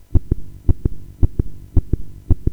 battito cardiaco; Castello Monselice

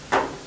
Colpo vicino; VillaMussolini

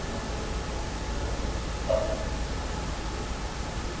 Rumore di goccia d acqua che cade su una superficie in metallo; Castello Marostica

